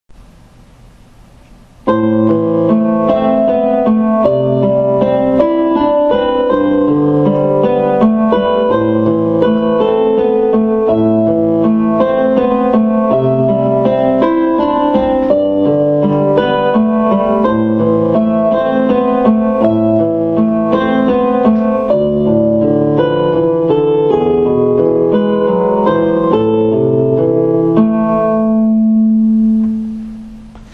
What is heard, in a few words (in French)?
harpe